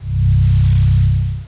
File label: pulse